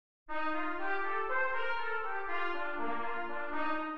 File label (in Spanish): Min7 en C